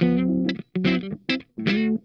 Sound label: STOP START, Funk Guitar; Zero G - Funk Guitar